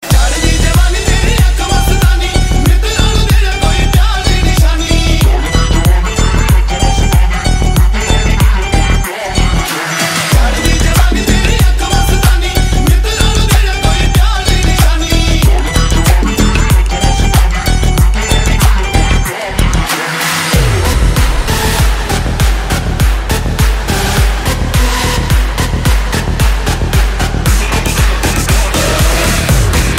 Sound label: punjabi original mix